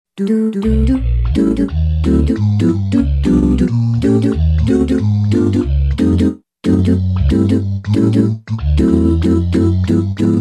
REV 3 VOCAL, Yamaha REX50

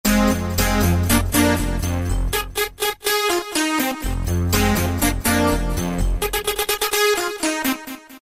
Gotham Chords; Roland PN-D50-02

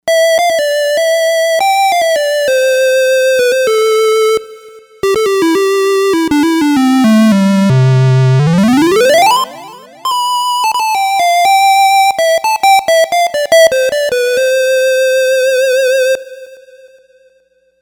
Pocket stylofone; Novation UltraNova; SuperNova Soundpack Volume 2

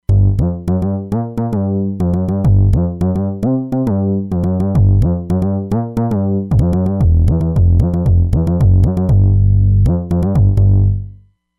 Q Bass; Roland Fantom XR

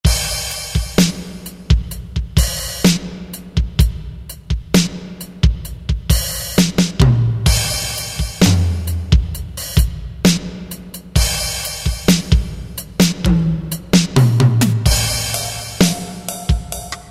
MT-32 Rhythm Set; Roland

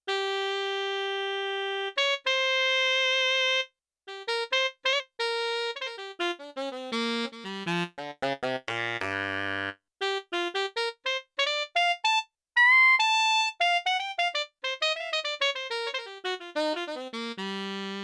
Filtered-EG, Four Saxes, Yamaha TX16W

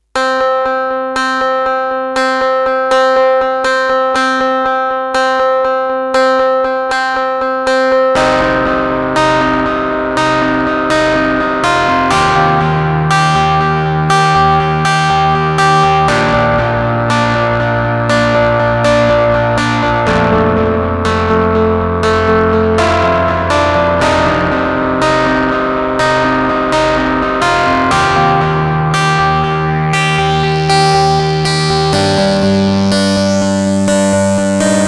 dist lulaby, M-Audio Venom

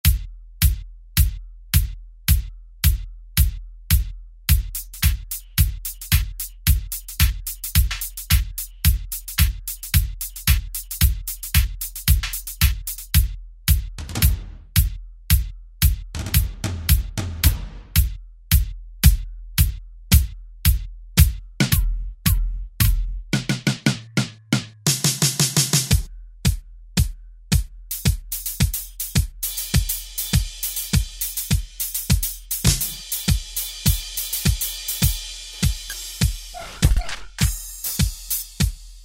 World Rave Kit; Kurzweil K2000 Contemporary ROM

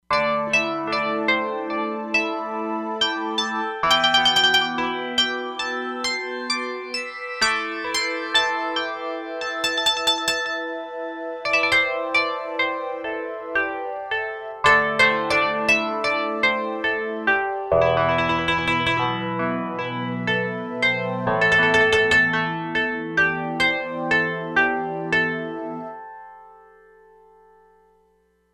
Lotus Land, E-mu Proteus-3; Factory patches

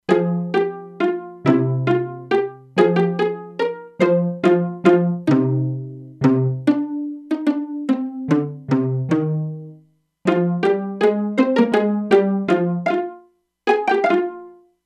Pizz Violas, E-mu Proteus-2; Factory Presets

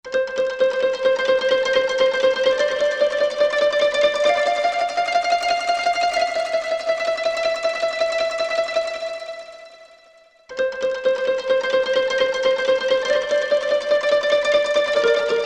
koto trem; Korg MSC-10S Ethnic

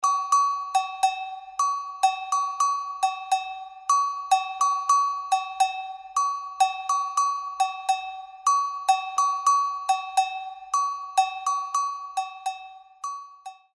World Agogo; Apple GarageBand 2; Apple Loops

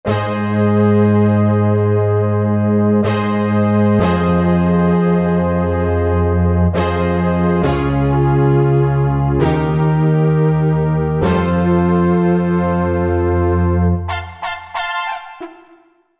brass, Korg MSC-12S Synth; Synth 3; Zeus brass

